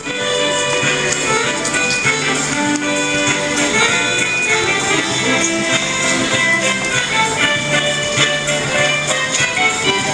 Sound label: jingle bells